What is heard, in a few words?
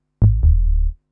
SNTH BASS TEKNO 140 A